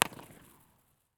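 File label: Underpass LA River, Impulse Response; Avenue 60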